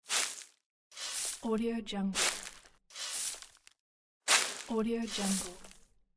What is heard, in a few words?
digging sand; tibasfx